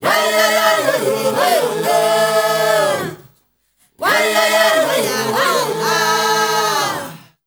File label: WEDDING SO, MIX.CHOIR; Spectrasonics; Heart of Africa 2